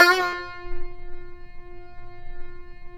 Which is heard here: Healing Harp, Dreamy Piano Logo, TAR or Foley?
TAR